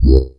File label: Wah, One Shot Bass